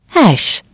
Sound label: key pound, Keyboard; ZTE T203